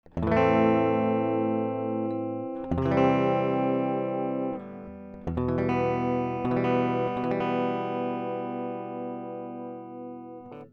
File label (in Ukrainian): focusrite scarlett solo 4th gen elektrogitara; Focusrite Scarlett Solo 4th Gen - Електрогітара; Focusrite Scarlett Solo це бюджетний аудіоінтерфейс за 180 $